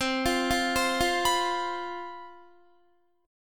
C Major 7th Suspended 4th, down, Guitar; CM7sus4 arpeggiated

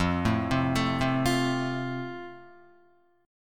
F Major, Guitar; arpeggiated

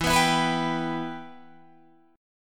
E Minor E, Guitar, DADGAD; down

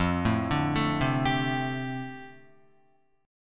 F 6th Add 9th F down Guitar, chord; F6add9 arpeggiated

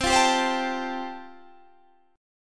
A-Minor 7th Sharp 5th C

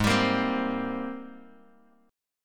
G sharp Major 9th G sharp, Bass Standard; G#M9 strummed; JGuitar

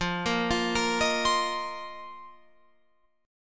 F 7th Suspended 4th F down Guitar Open F; arpeggiated

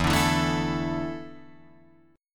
E 7th Suspended 4th, Guitar, Drop D

